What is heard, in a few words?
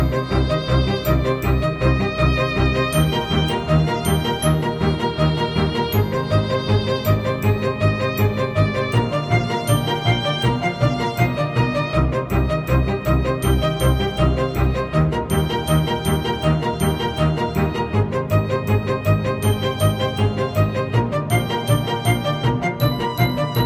String; Gravity Falls